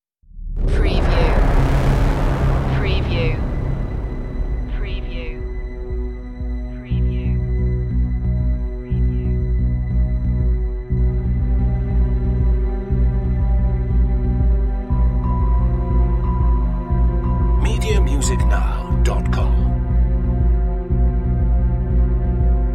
underworld series dramatic cue

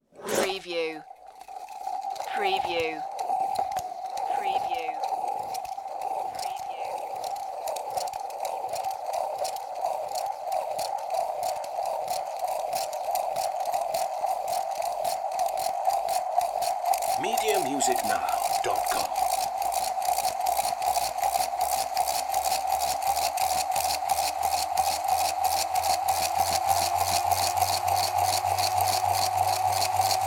gyrotwister

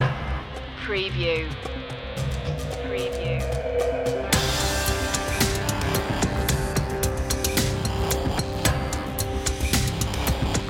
no keys synth strings

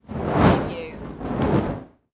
Torch Movement Whoosh

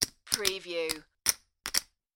Poker Chips Stacking

